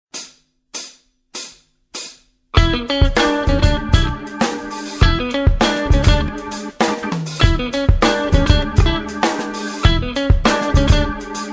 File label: blues jazz